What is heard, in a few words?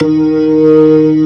B3 Lo Slow D#2; Proteus 2000; Digital Sound Factory Proteus 2000